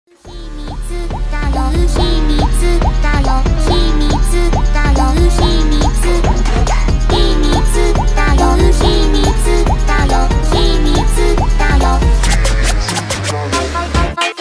brazilian phonk

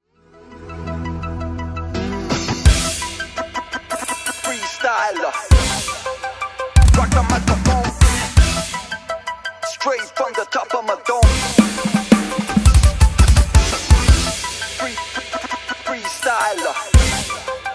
drum cover

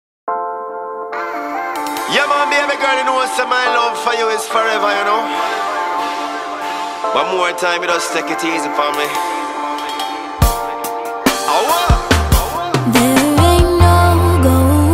reggae cover

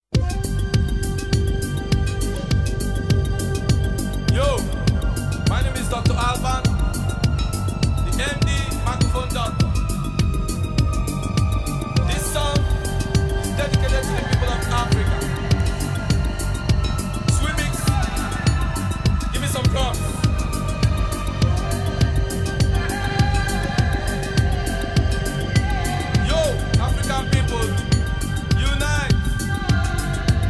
bleep bass mix

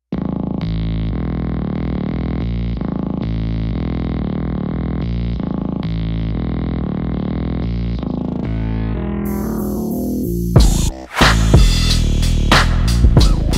instrumental

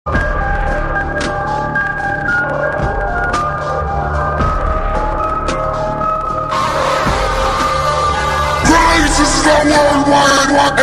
slowed remix bass boosted

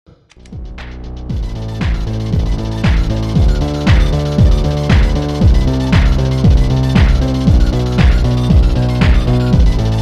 slowed reverb ending part

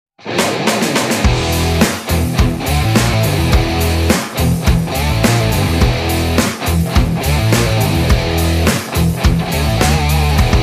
metal cover

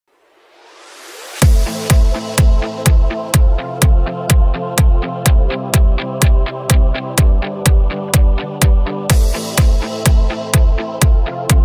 euro dance euro disco

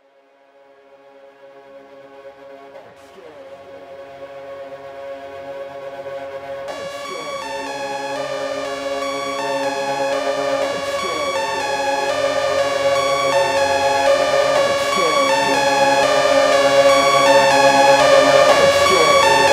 slowed

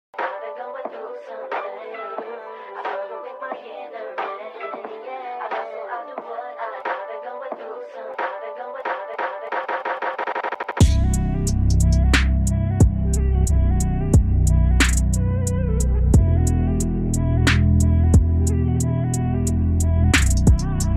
instrumental

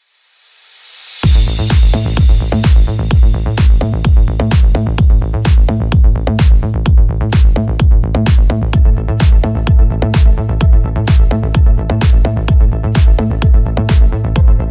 vocal trance

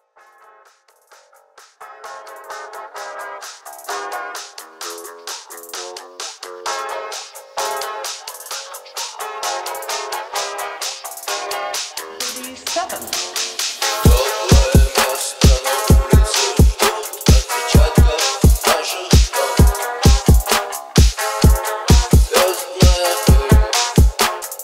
phonk remix

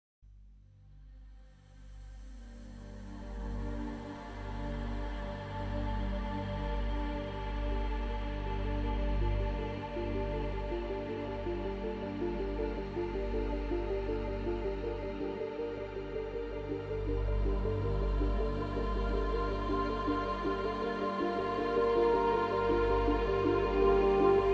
laser harp live performance

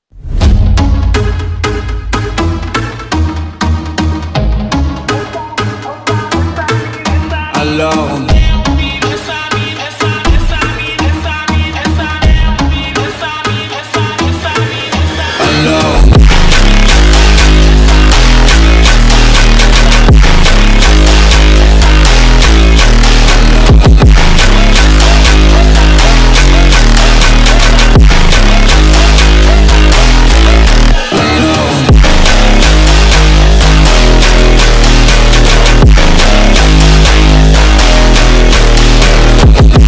brazillian phonk remix

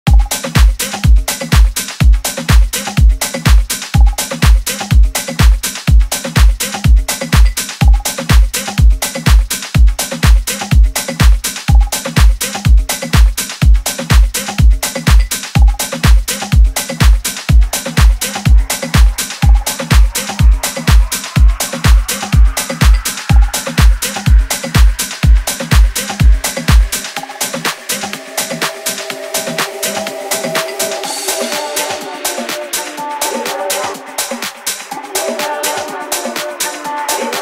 ukelele

